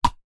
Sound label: handball slap hit; sport